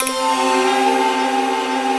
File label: ATMO SYNTH